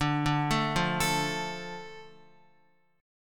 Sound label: D Minor D Guitar; Dm arpeggiated; JGuitar